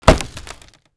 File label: wood; Mafie 1